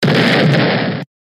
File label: explosion sound effect; roblox